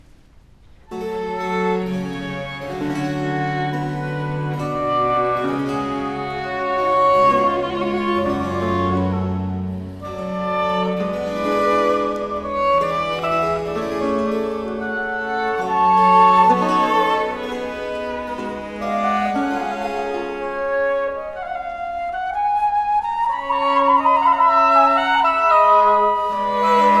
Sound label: Ritournelle; Suite from Les Fetes D'Hebe; Rameau